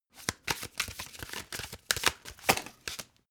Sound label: playing cards shuffle regular